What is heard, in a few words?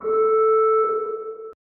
Alarm; Barotrauma; TSFX